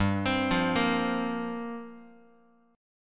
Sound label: G Minor 11th G Guitar; Gm11 arpeggiated; JGuitar